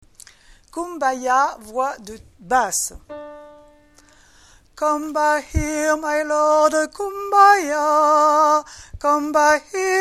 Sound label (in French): basses, Gospel voix par voix; Kumbaya